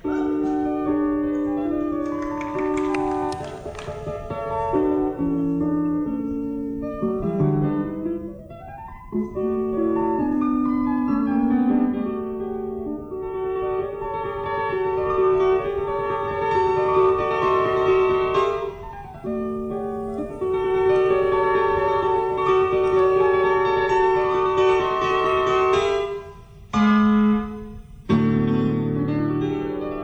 Piano Solo

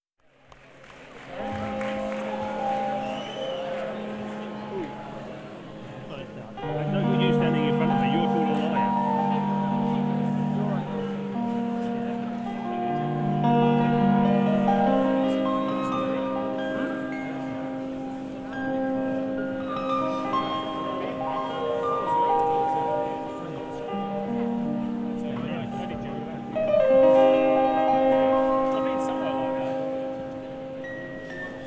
Piano Solo

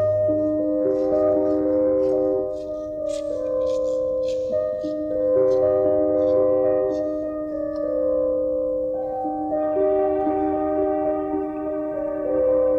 Piano Improvisation

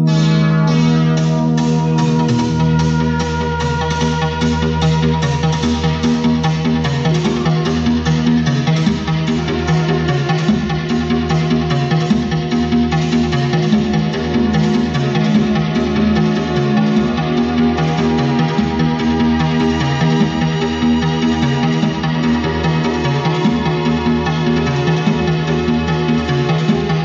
Diamond Diary, guitar part

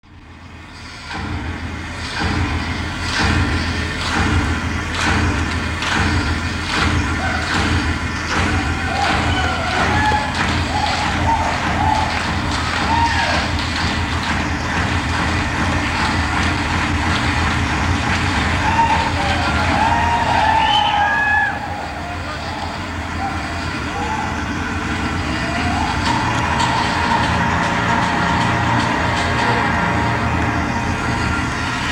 Percussion Solo

